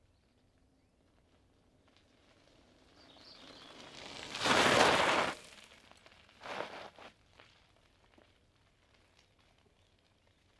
Bicyle stopping

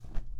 Cloth

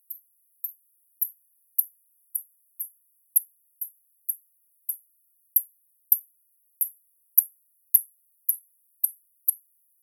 Bats Hunting For Insects 1x Normal

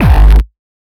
Kick

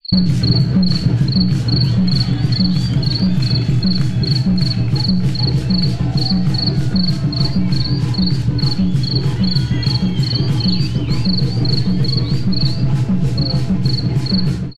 African Drums At Nigth

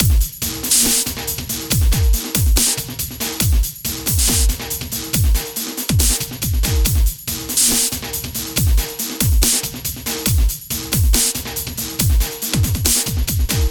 Stereo Dnb

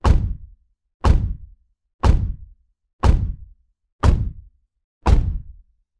Car Door Mimicking Heavy Robot Footstep Sounds

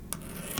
Nail Scratch

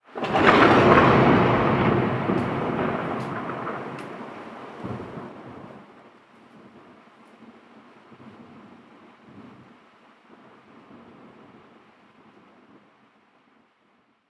Thunder Clap

